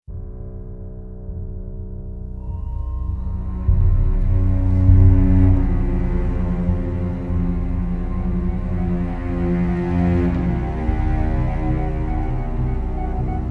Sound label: Drones